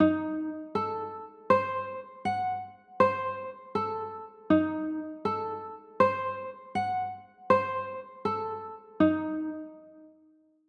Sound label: g flat dim, chords; g flat dim7